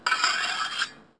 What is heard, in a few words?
Sword